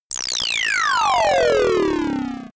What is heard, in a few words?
fall